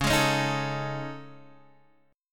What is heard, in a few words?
A Major 7th Flat 5th